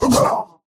pain death; Slork

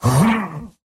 pain big; Slork

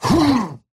effort melee small; Slork

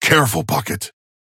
ping careful synth

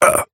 pain small; Operative

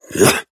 effort melee small; Operative

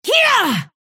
effort melee big

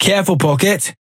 ping careful synth

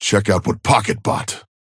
ping synth check items

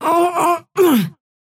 pain death

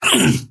pain small

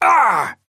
effort melee small; Trapper

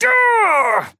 pain small; Trapper

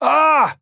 pain small; Trapper

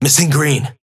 Synth ping missing

